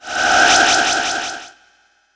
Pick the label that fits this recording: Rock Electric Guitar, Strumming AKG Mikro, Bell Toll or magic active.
magic active